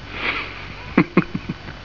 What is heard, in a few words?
laugh; lorrelibrary; b-wing